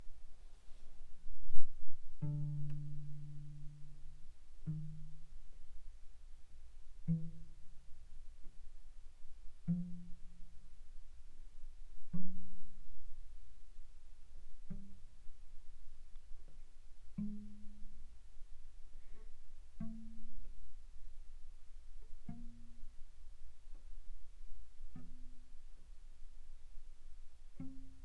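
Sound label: Cello pizz pp sul D D3 Db4 stereo, pizzicato; Strings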